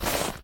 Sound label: snow